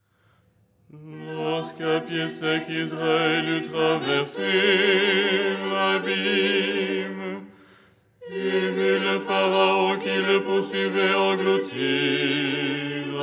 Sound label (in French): basse; 1er hirmos du canon du dimanche; Les 8 tons en français; ton 6